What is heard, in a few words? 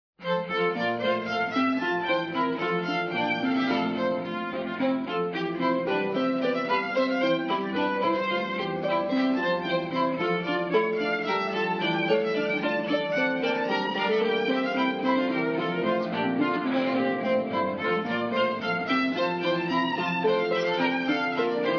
Bouree Water Music